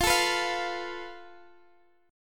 F Major Flat 5th, down Guitar Drop D

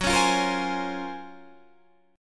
Gb Major Sharp 11th, Guitar DADGAD; GbM#11 strummed

